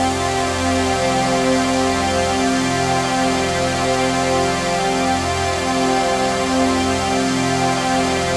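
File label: trance pad; Best Service; XX Large - Pads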